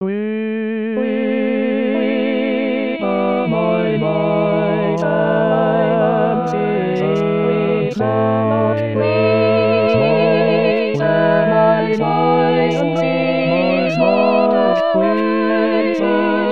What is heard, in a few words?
Weep O mine eyes All